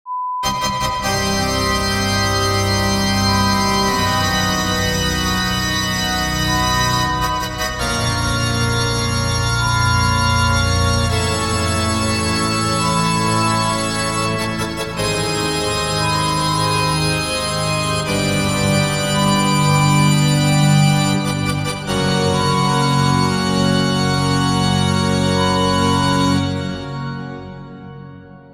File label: Draculas Organ Horror Sound Effect